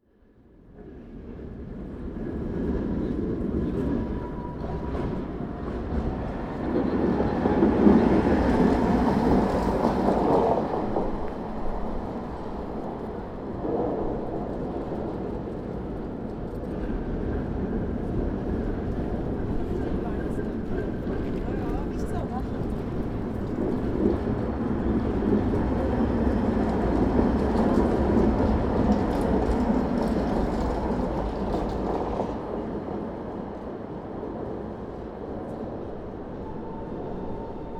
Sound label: Trams People, field; Prag Field